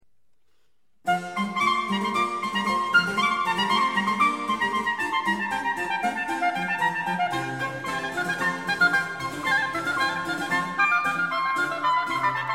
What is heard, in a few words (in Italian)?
Allegro assai; 2 Brandenburgisches Konzert